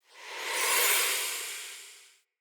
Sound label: Sword Melee Wind Up; Weapons; Player; Swords; Fenn Jotar Sword